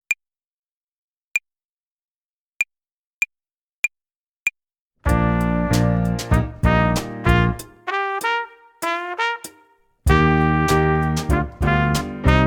tempo und rhythmus uebung hihat play along

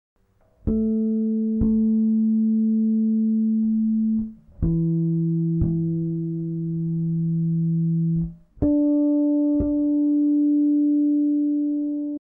stimmen mit flageoletts

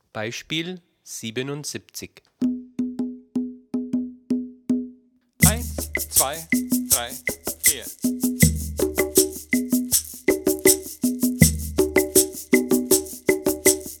viererrhythmus

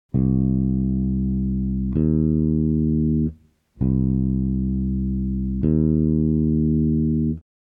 kleine terz

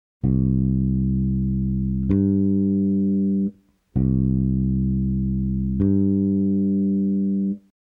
reine Quinte

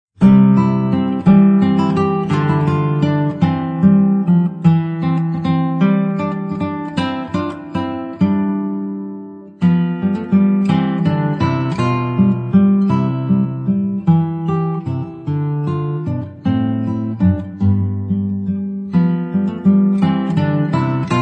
walzer

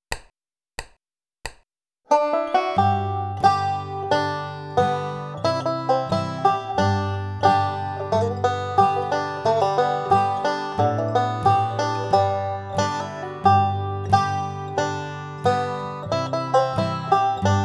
hammer on pull off slide und triole; cripple creek

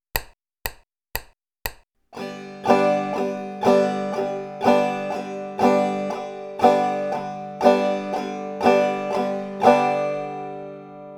Betonung im Viervierteltakt auf 2 und 4

